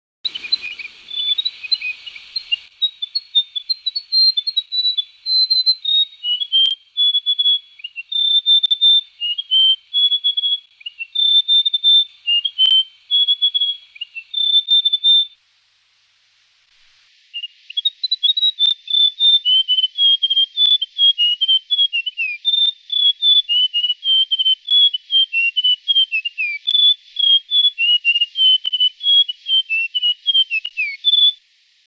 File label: western gerygone; scrubwrens gerygones thornbills pardalotes and allied birds